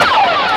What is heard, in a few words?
guitar fret fumble